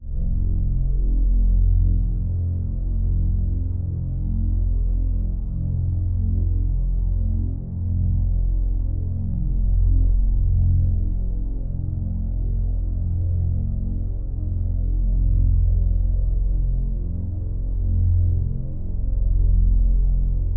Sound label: ambients; Secrets of Arcadia; king ramsey